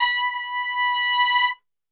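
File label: B 2 trumpet; Best Service; Brass Super Section; TRP SWL; 90 s Sample CDs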